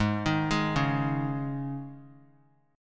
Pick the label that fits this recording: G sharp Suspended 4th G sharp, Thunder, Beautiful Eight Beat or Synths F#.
G sharp Suspended 4th G sharp